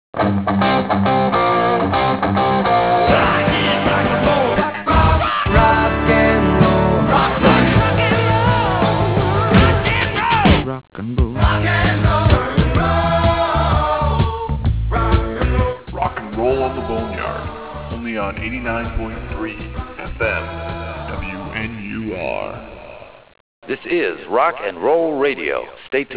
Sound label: rocknroll